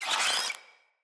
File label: Infested Ashen Vocal Pain; Enemies